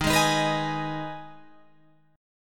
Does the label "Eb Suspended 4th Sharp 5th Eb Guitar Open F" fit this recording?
Yes